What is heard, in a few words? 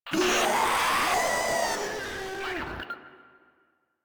Vocal Attack; Proto Infested; Warframe; Infested Ninety Nine